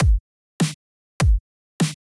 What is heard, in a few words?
edm